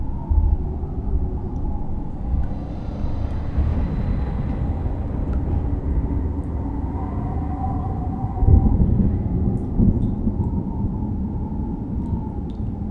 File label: Amb Catacombs Stormscape